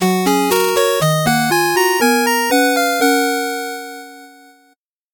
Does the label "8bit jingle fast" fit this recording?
Yes